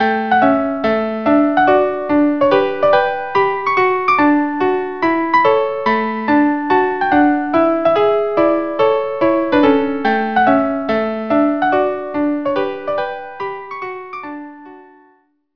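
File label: mazurka duo violin viola; copellia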